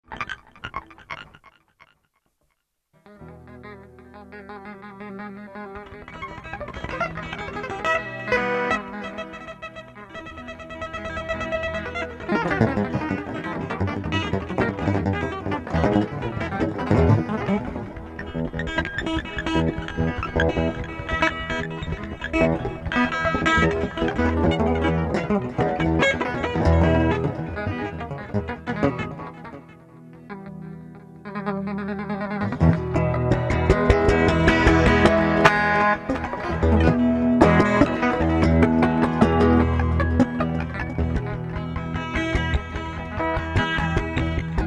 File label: space retro, guitar